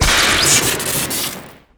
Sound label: device impact crit; weapons; discipline; tf2